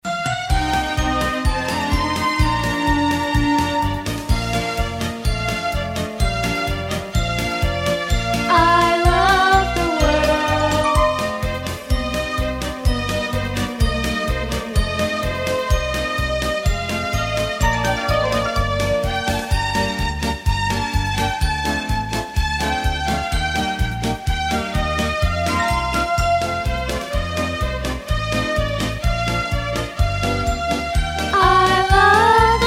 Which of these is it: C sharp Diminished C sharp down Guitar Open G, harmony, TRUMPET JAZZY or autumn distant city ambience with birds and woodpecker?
harmony